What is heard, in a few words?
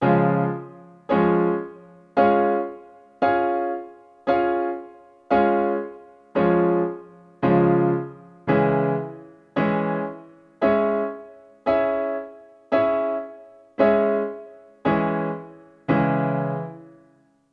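triad and inversion block chord in D flat and C sharp minor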